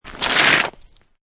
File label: Snow And Ice Ice Hard Gravel Scrape Fragments; Weather